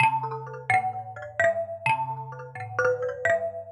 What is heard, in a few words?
Tiny Thumb Piano Melody G Minor 129 bpm; MIDI Clips; Tonal; Ableton; Factory Packs; Lost and Found